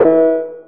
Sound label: unlock; timber